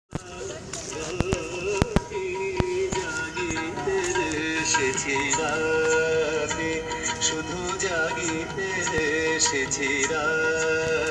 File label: bangla music